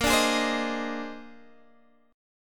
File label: A sharp 11th, Guitar DADGAD